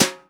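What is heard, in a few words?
snare Brush, EMU Orbit-3 drum machine; 300 Drum Machines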